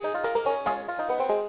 rattle; Deers Revenge

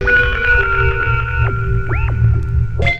synth, Drum+Bass, 160, C; Keys

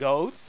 horn

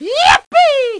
yipee; My Science Fair

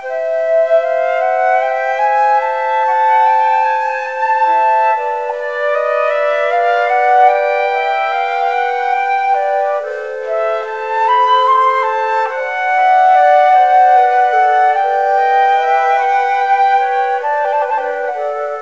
flute

